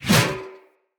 Sphere Board Whoosh

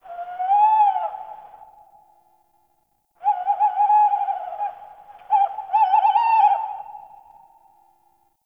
night tree critters; Ambience; Warframe; Eidolon Plains

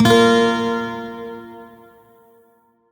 Shawzin Chromatic Chord K; Warframe; Lotus Shawzin

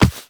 walk default, footsteps; Warframe; Characters; Operator

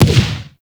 Shrapnel Shotgun Fire; Warframe; Railjack; RailJack Weapons

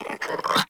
Puppy Idle Pant Grunt; Vocals; Warframe; Kubrow

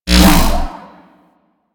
Kick Punch Whoosh; Warframe; Weapons; Corpus

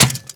Worker Metal Leg Step Default; Warframe; Characters; Solaris

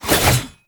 Staff Swing Spin Sweetener; Warframe

